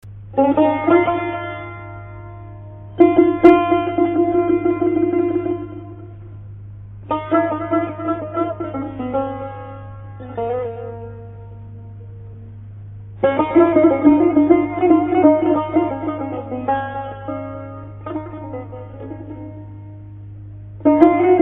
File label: tar